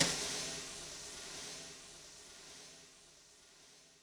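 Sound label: Brush Attack, Electric Snare, Yamaha DTXpress; BrshAtak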